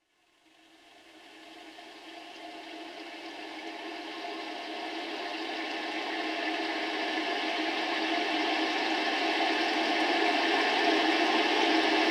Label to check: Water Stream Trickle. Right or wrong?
Right